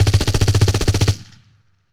German Assault Rifle Long Burst; Weapons & Firearms; Sony Pictures Sound Effects Series Volumes 1-10; Sony Creative Software

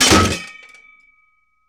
Metal & Wood Impact; Impacts & Destruction Sweeteners; Sony Pictures Sound Effects Series Volumes 1-10; Sony Creative Software

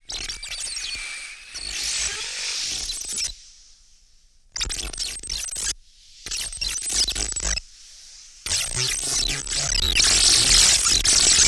Transmission Interception, Electronics; Sony Pictures Sound Effects Series Volumes 1-10; Sony Creative Software

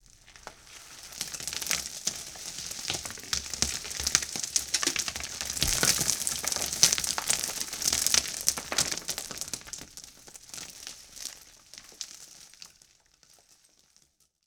Debris Fine Foley; Impacts & Destruction Sweeteners; Sony Pictures Sound Effects Series Volumes 1-10; Sony Creative Software

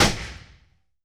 Pistol Shot 22cal; Weapons & Firearms; Sony Pictures Sound Effects Series Volumes 1-10; Sony Creative Software

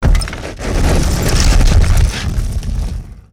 Debris Deep Rumble; Impacts & Destruction Sweeteners; Sony Pictures Sound Effects Series Volumes 1-10; Sony Creative Software

